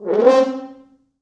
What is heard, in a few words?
Horns Sax, FrHorns FXMOD; C3; East West; Quantum Leap Horns Sax; 4 FrHorns FXMOD